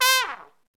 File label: SOLO TRUMPET